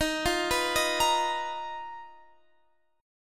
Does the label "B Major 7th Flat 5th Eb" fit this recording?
Yes